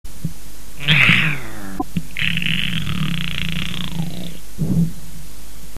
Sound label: grrrowl